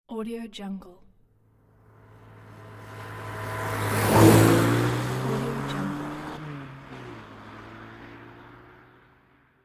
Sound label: fast car pass by; soundproductionfx